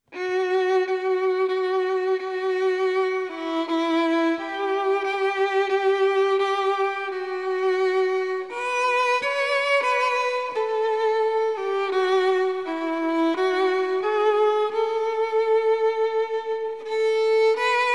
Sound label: Violin